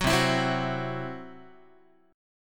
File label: C Major Flat 5th, Guitar; strummed